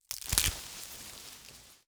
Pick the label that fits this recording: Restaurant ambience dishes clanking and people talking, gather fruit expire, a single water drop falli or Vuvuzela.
gather fruit expire